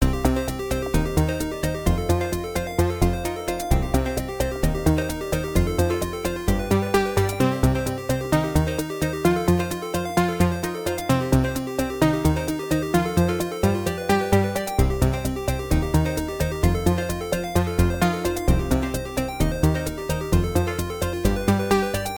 freespin melody; games; All Ways Joker

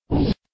gamble start; Just Jewels

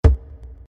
reel spin click; Imperial Girls

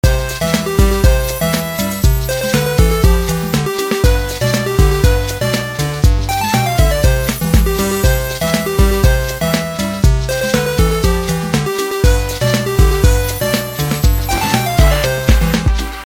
free spin melody; Red Chilli

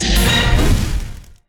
expand wild; Fire Queen

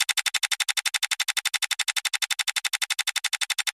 bigwin increment; Wazdan

